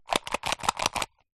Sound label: food candy bubblegum tape piece in plastic tub shake